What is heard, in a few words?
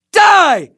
Elder Charge, voiceovers; english